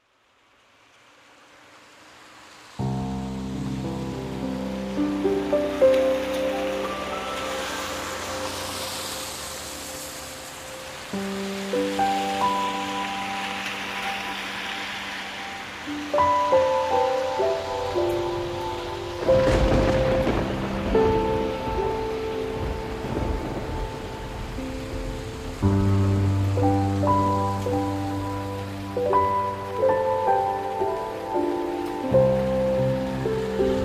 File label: Piano and Rain